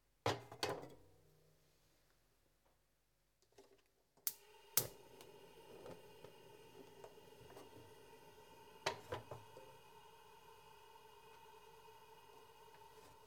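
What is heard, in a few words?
Kettle whistling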